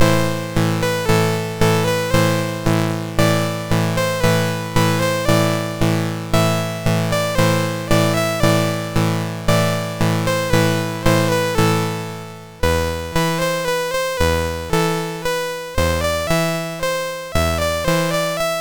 sid, MOS6581R4; Chief Tomahawks Games

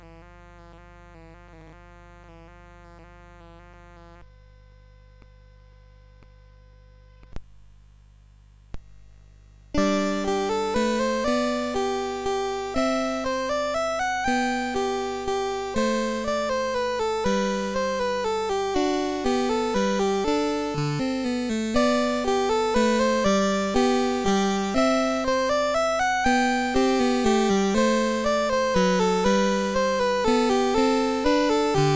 Minuet, CSG8580R5

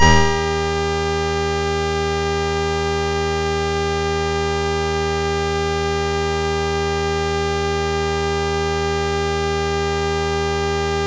sid, MOS6581R2; Bird Mother

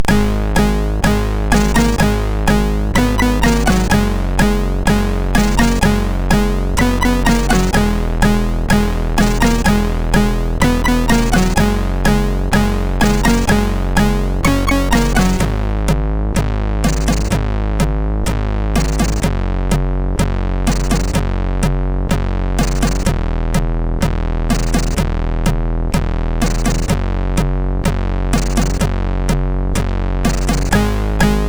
Intro, MOS6581R2

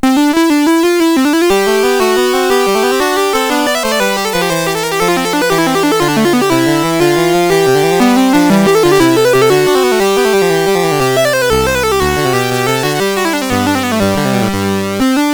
Fugue in C Major, CSG8580R5

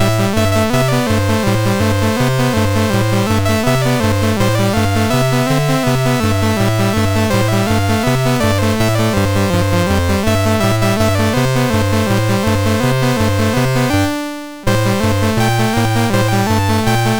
Balalaika, MOS6581R3

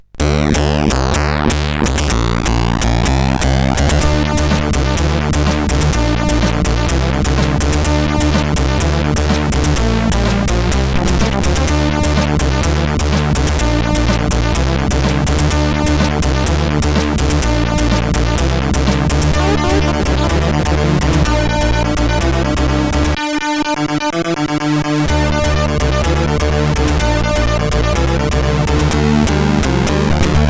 sid, CSG8580R5; Amazon

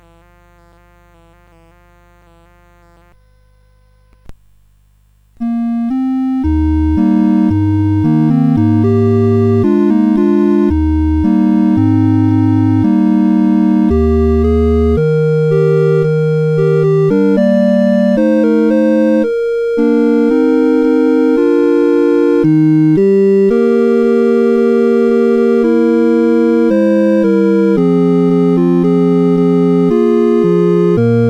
CSG8580; CSG8580R5

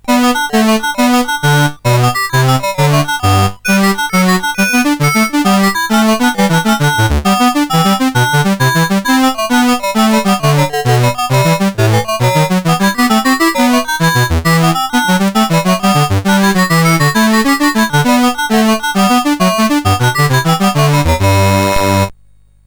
Gigue, CSG8580R5; Sub Roc 3-D

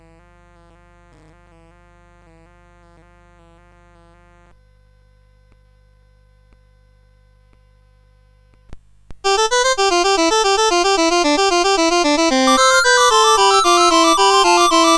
Fugue in C Major, CSG8580R5

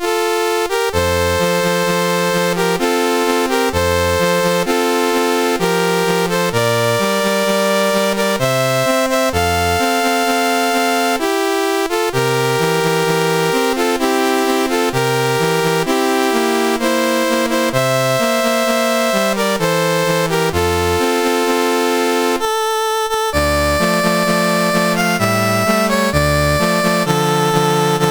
Greece, National Anthems, CSG8580R5

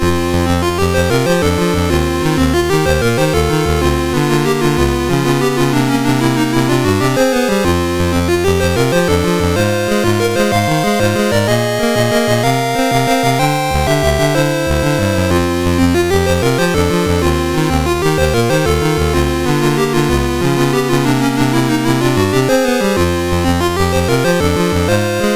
sid, MOS6581R3; Double Dribble

